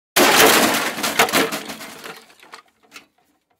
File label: Junk Crash